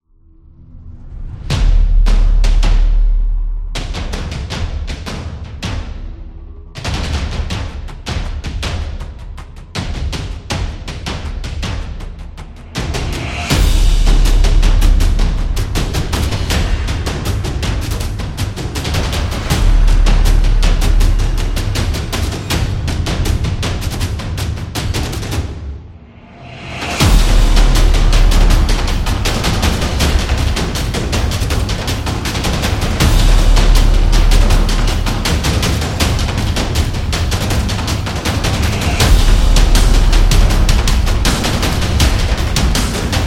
Drums Trailer

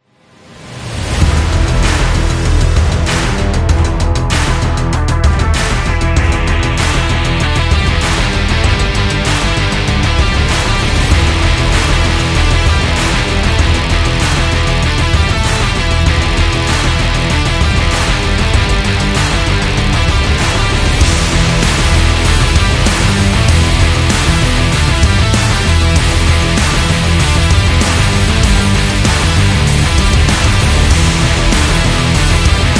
Military Action; electro rock

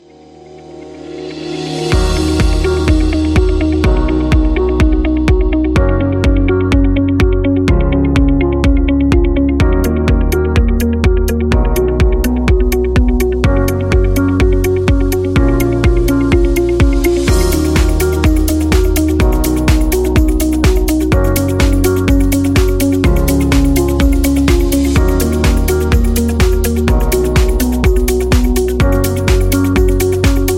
Office Background

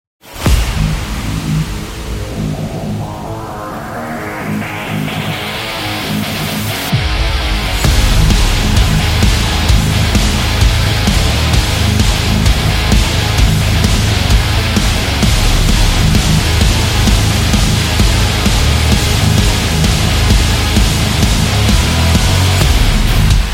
Hardness

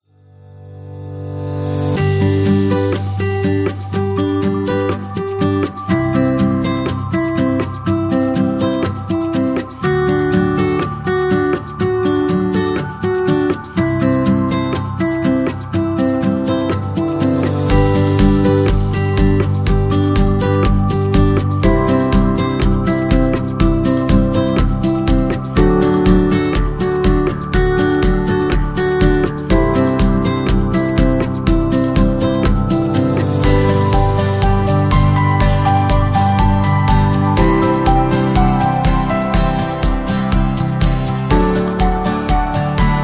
Guitar Positive

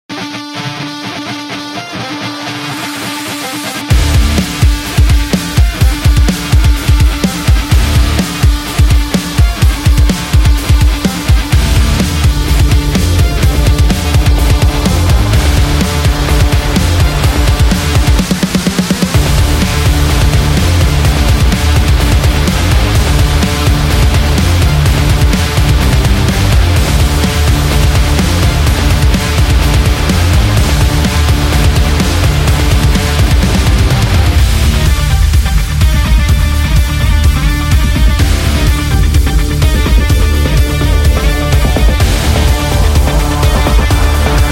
Angry; gym rock

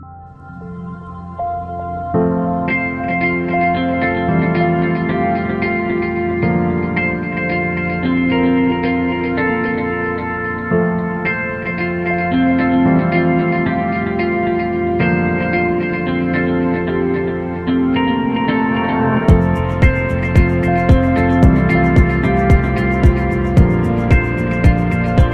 Beauty Ambient; corporate music vol 10

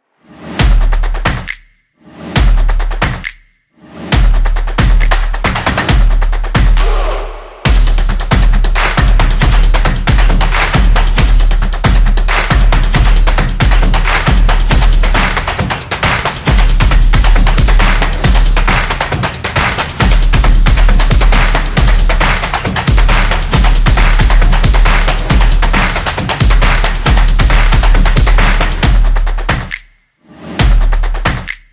Drums; sports

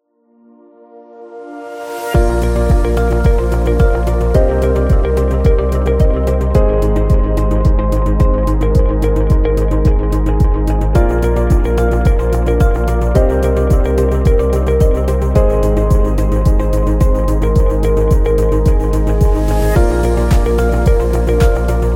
Speech Background

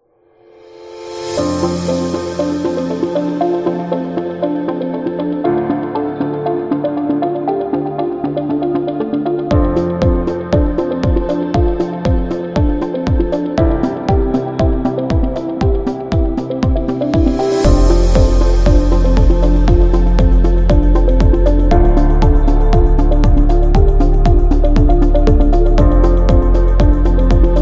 Beat Corporate; motivational speech

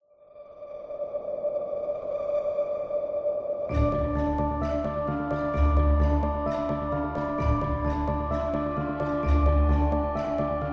Creepy

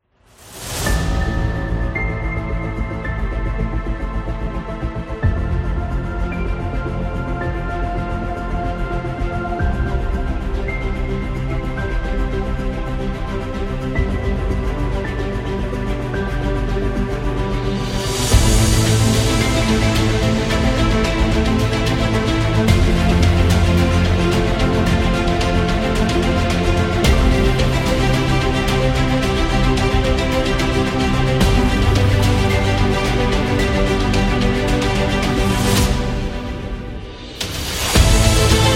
An Epic; corporate promo

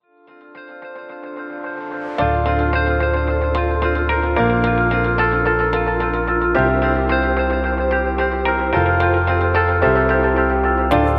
Time Lapse Corporate, corporate music; corporate music vol 17

